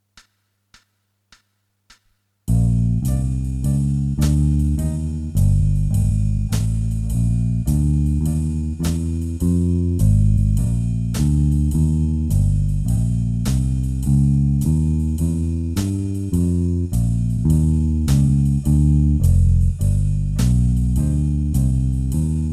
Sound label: walking bass